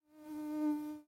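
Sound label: short nasty mosquito squeak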